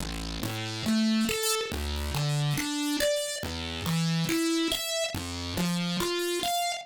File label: synth tekno 140 A; Techno2; Keys